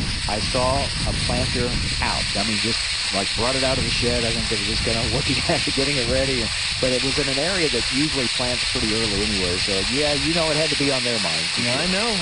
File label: loading grain bin radio normal